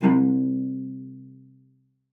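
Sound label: cello spiccato C5, cello; Xsample VOL-5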